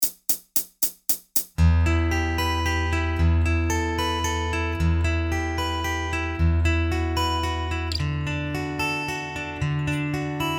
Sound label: Triads, guitar; Triads Unleashed